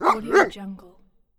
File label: large dog bark; stormwaveaudio